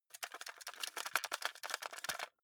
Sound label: cassette shake long